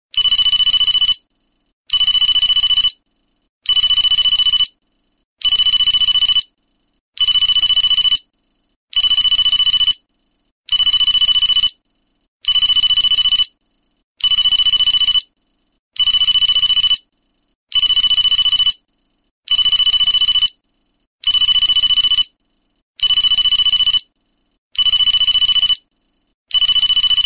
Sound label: corporate ringer